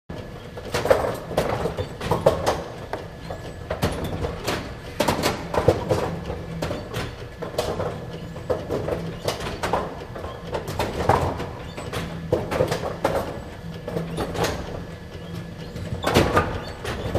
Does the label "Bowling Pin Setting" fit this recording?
Yes